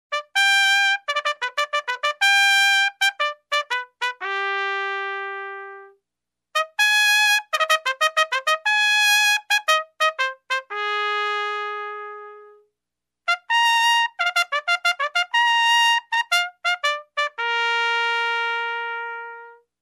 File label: Trumpet Fanfare in different keys